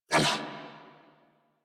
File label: Pain Grineer Male; New War; Narmer